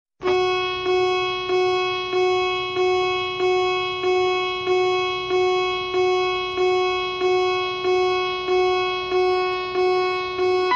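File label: alarm sounds; zvuki trevogi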